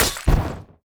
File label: enemy cutthroat Rat stealth Skill one Shot; sfx